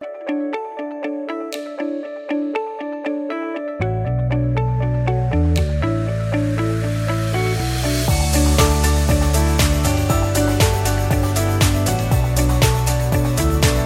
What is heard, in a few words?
Upbeat Pop; MIUI12